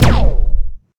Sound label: resonator fire, weapons